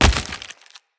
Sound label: Wood Flesh Impact; Weapon Impacts